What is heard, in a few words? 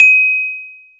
CELESTA D#7; AKAI S-Series CD-ROM Sound Library VOL-2